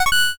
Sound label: connect ok